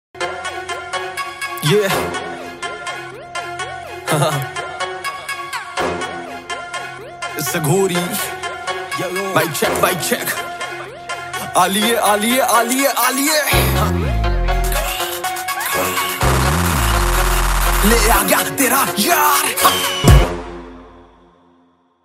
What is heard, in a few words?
Haryanvi Hip Hop